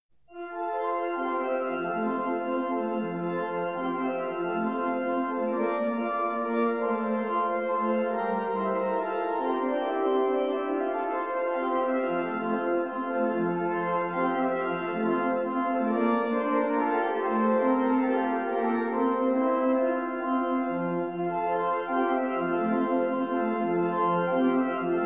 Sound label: waltz; bach